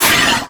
imp pain, monsters; Doom

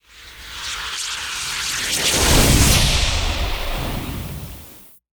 missile miss; strogg; Quake 4 Alpha; id software

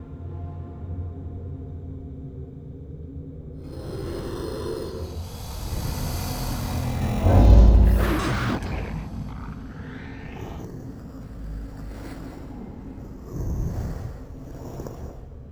bathroom; DoomRTX

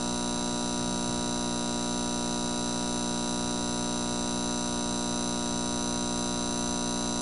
loop neonlight buzz; Doom

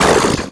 imp chatter combat, monsters; Doom

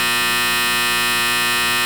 loop light; Doom

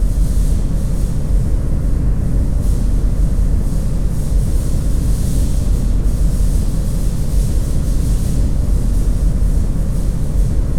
cryo cave amb; Quake 4 Alpha; id software

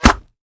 Bullet hit body; DoomRTX; impact flesh

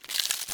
tentacles in; DoomRTX

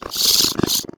imp chatter, monsters; DoomRTX; imp5

